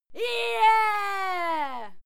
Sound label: female cheer yeah; schreie; menschen